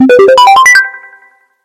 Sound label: lg bubble alert; ringtones; sms